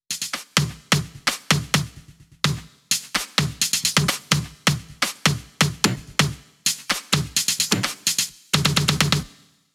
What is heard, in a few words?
Loop 128BPM Perc; VEC5 Drumloops; VEC5 Multi Loops 128BPM; Loop Elements